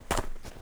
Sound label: footstep trainers snow compact walk fast